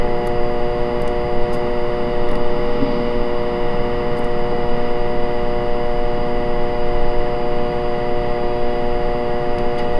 quite underground warehou; flickering

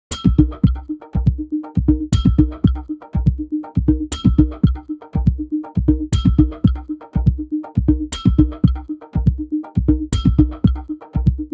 short bright sonic logoid, kalimba

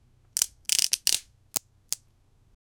quick snapping sound, string

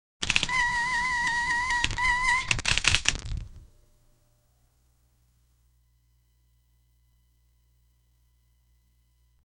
whip crack; batman

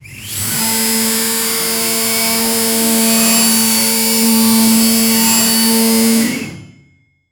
drilling and jackhammer; percussive

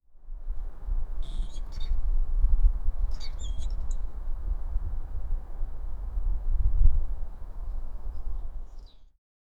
sound of eagle, wings

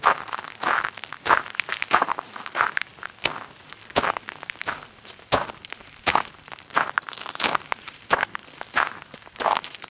seamless loop of sound of, gravel

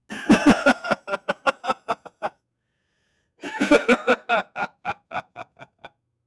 man chuckle, amusement

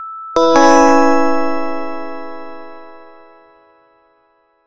kawaii style cute pop sou

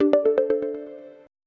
game over cue quick, melancholy

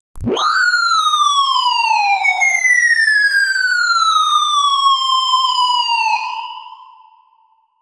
make an operating system, system

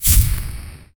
a short burst of cracklin, spark

